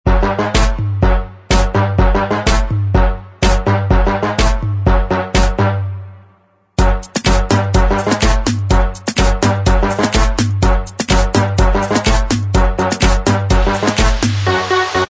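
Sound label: instrumental track, backing tracks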